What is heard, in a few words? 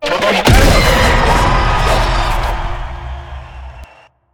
activate; smite; poseidon